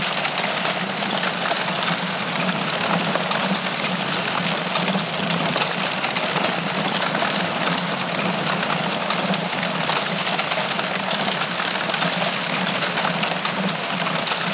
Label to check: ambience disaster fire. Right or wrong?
Right